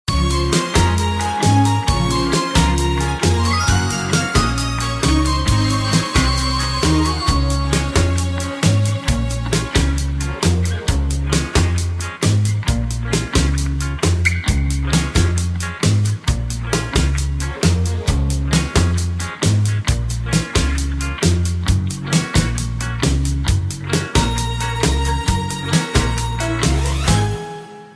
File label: (Db) No Backing Vocals